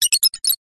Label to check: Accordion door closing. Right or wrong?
Wrong